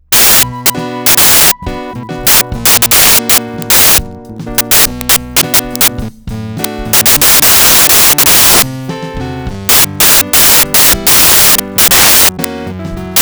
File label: elecpiano drums